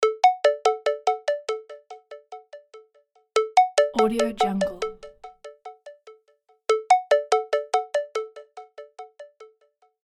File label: wake up ringtone